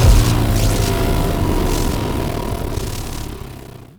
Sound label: Dead End Crystal Power Down; Echoes; Warframe; Entrati